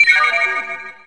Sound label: camera shutter crystal; Samsung SGH-i320; Camera Sounds